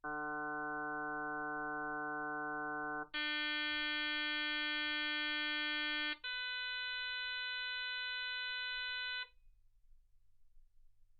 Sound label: harm sinus 48kHz